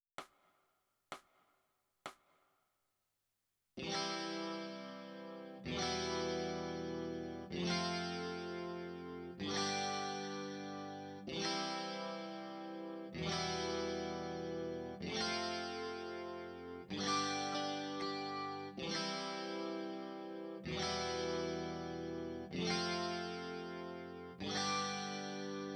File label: E Guitar